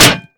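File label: destroyed fire extinguisher; Call of Duty Modern Warfare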